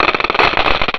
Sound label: tick